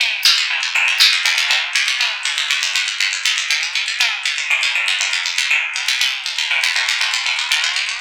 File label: Structured Rhythm, IDM Rhythms